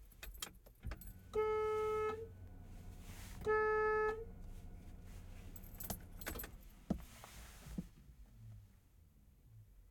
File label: horn interior, De Tomaso Pantera 1972 with BMW Alpina V8; Pole Position